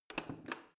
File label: plastic button creak press